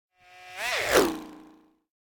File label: Space Ship By - Space Motorcycle; Hollywood Edge; sound designer's toolkit v2